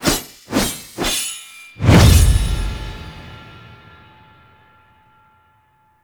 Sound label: Sword Swing; Cinematics; Teshin Intro